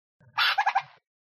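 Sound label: Burrowing Owl alarm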